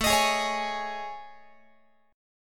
A Major 7th Flat 5th, Guitar; AM7b5; strummed; x,17,18,18,17,19

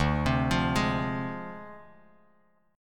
D Minor 7th Flat 5th Bass Standard; Dm7b5; arpeggiated

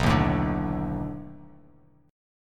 B 7th Sharp 9th, down, Bass Standard; strummed

